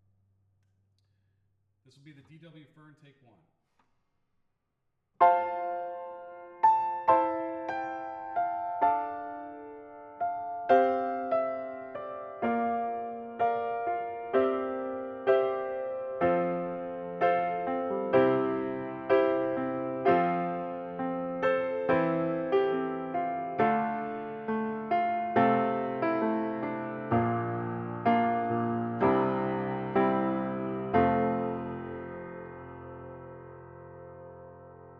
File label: Piano; mic pre shootout